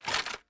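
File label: Inspect Movement; Valorant; judge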